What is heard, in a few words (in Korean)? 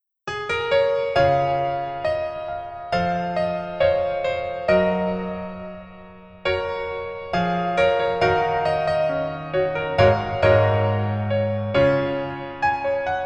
Piano ver, 여자키